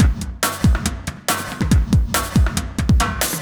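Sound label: industrial 140 kit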